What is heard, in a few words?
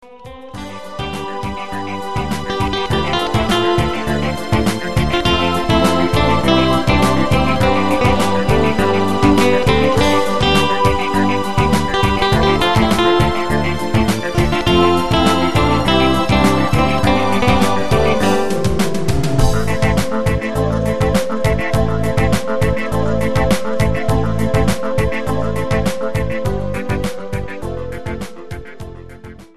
Modal Harmony